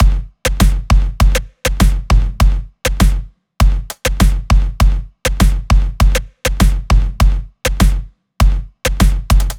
EDM Drum Loop 100 BPM; Dance; 99Sounds Music Loops; 99Sounds x Monosounds

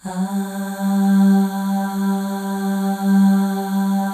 AHS, FEM; Spectrasonics; FEM.2 AHS

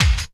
BD, Drum & Bass, 160 BPM

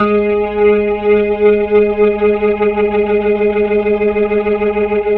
HAMMOND G#; 3D Sound; 3D Keys VOL-1

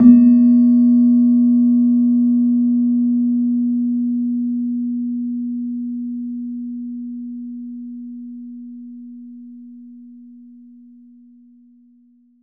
PELOG A#1, PELOG BASS; Soundscan vol.60; AKAI

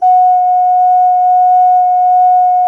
SOP F#, GEMSHORN; SoundBytes - Early Patches

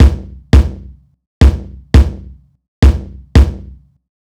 Kick, Kick Loops; KJ Sawka - Drum n Bass & Beyond; Drum Tool Box

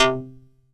MTL, SYNTH BASS; East Collexion; Bass S3000

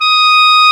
Clarinet; Roland LCDP04; 90 s Sample CDs; Clar Cls

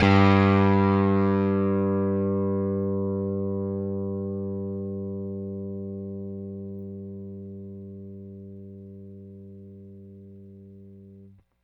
Rickenbacker Bass; Airwindows

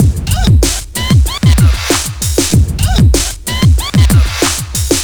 scratch n sniff

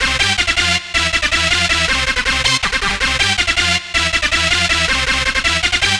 Dance Synths, Hardcore Loops, Synth Loops

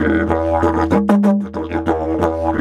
Didge 93 BPM, Didgeridoo

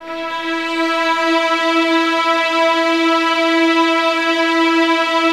Violins E 4, String Ensembles; Light; Miroslav Vitous; 11 Violins

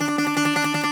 Greek Bazouki, Plucked Strings

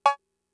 Cowbell; Fricke MFB-522

